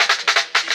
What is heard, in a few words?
Fill, Drum N Bass Percussion Fills n Breaks